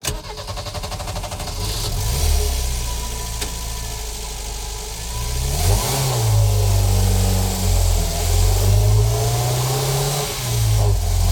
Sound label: Renault R4 GTL Drive Various Speed Gear Down Exterior, Engine Compartment Mic; Mono; Justsoundeffects